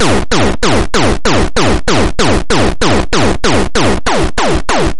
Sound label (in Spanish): bajo